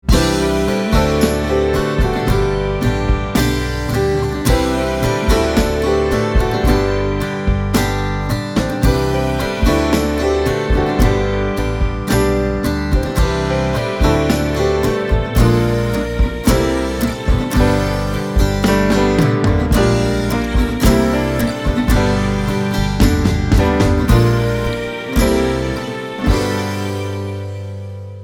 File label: INSTRUMENTAL